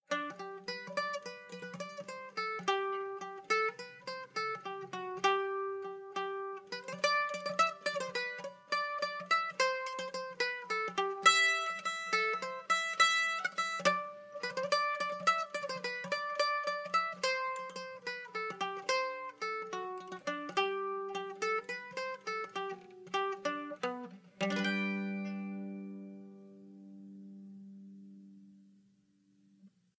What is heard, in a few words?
Guitar; Assassin's Creed Unity Dead Kings